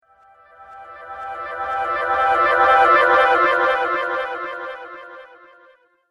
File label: Flute Transition; Transition Sound Effects